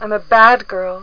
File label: Sms Ringtone